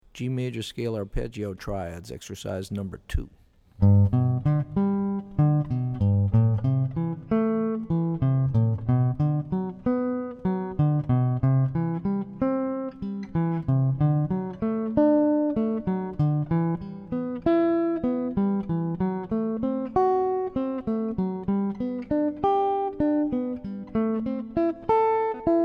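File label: G Major Scale Arp Triads; lead skills materials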